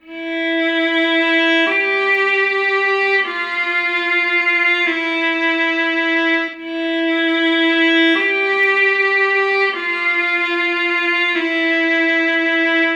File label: Strings